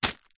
foley packet plastic tightly wrapped small rubber items catch hand